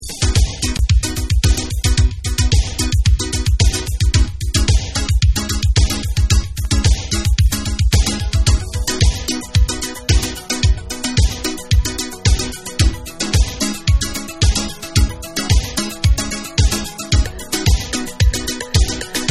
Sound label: Instrumental Dub